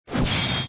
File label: UI scatter; football; Brazil